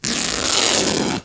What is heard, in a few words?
TEAR; Microsoft Office 95 Professional; VALUPACK